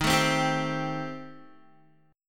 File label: Eb 6th Flat 5th, Guitar; Eb6b5 strummed